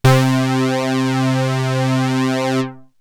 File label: ANALOG, BASS; Best Service; Hyper Dance